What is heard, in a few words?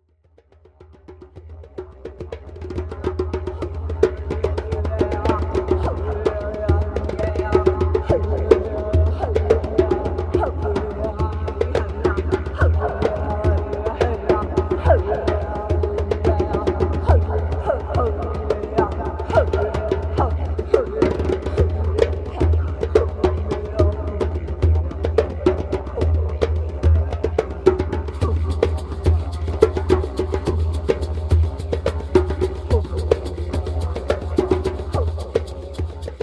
sacred chant